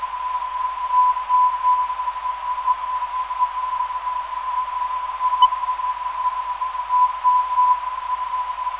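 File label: non-directional beacon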